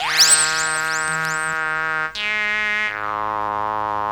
Signal; Blu Mar Ten; Jungle Jungle - 1989 to 1999 Samplepack

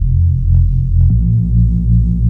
Reese - 3A, Jungle; Bass; Blu Mar Ten; Jungle - 1989 to 1999

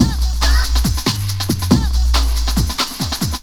Hurt U So Break; Jungle; Breaks; Blu Mar Ten - Jungle Jungle - 1989 to 1999 Samplepack

